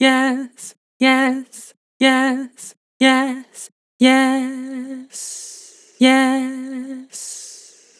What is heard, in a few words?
Vibrato "Yes", Vocals; Harmor Vocals